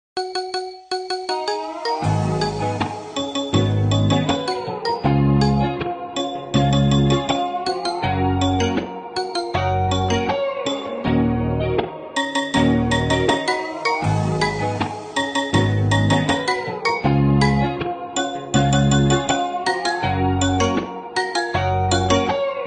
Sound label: iphone marimba ringtone